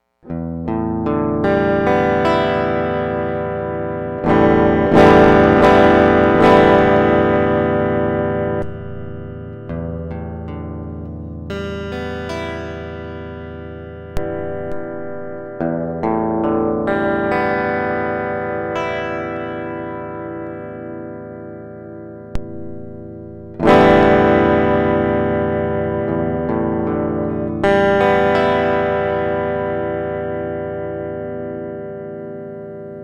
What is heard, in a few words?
pickups, guitars